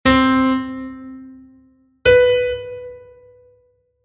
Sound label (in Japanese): 音当てゲーム（シ）